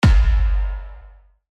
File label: Epic Hit; افکت صدای ضربه سینمایی